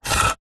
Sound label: office pen biro draw line on wood